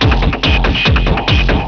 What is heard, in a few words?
wooden